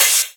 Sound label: Open HH, Cymbals